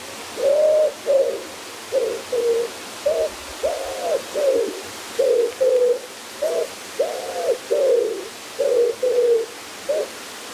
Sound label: Woodpigeon; outdoor